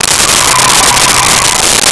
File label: Tire Squeal; Nintendo; July 2020 Gigaleak